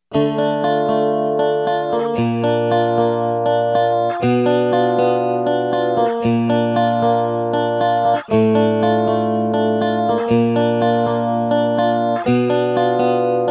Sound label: STEREO FLANGE, Yamaha REX50